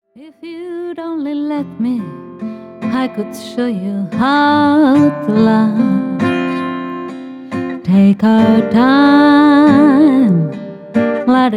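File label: Female Vocals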